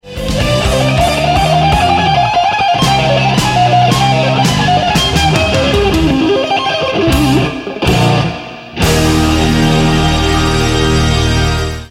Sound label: sweep